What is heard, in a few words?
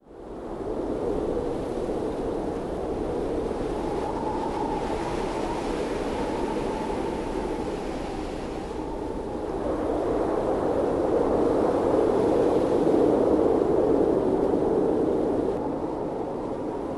amb wind